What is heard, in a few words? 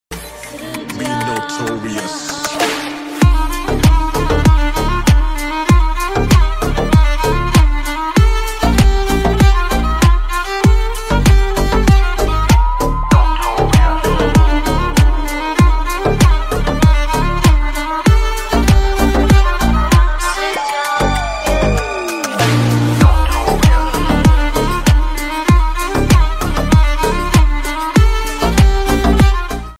Arabic Trap